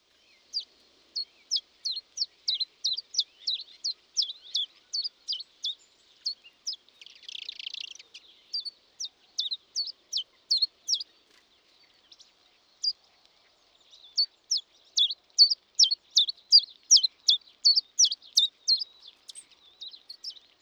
Pilkoji Pečialinda - Common Chiffchaff - Phylloscopus collybita